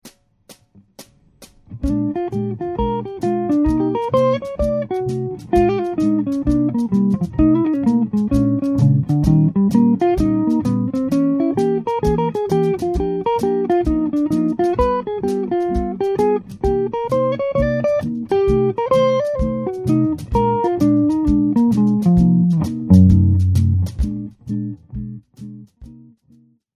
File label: blues